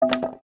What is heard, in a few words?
wood